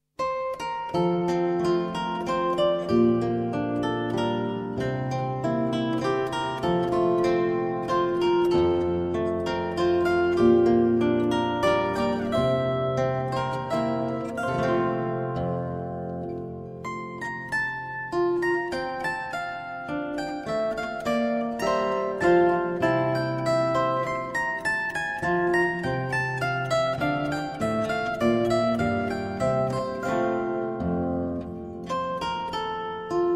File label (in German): Kleiner Walzer, zither